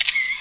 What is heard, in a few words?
shutter; eyesee-mpp; dragonboard